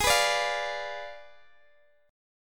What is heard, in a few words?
G sharp Diminished 7th, Guitar, Open D; x,x,18,17,17,15